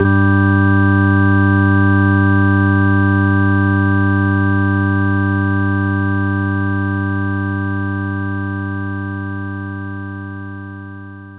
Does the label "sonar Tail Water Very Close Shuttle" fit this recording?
No